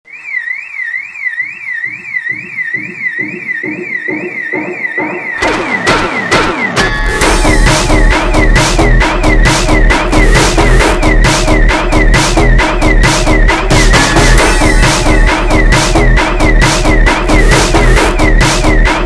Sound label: Hardtekk slowed; funk